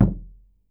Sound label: KOO KU M, KOREAN DRUMS; AKAI S6000 CD-ROM; WorldSounds